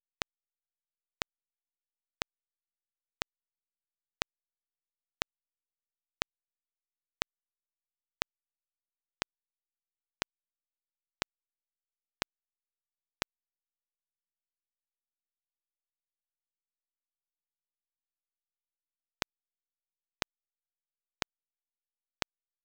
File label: Ambience Reverberation clicks; Surround Sound Setup and Imaging; Surround Spectacular